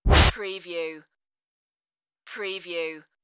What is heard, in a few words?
Wipe